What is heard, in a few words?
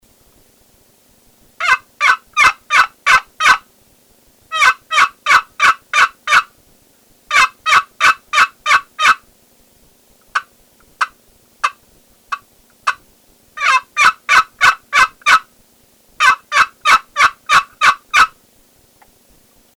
Walnut Dual Hens Call; Porter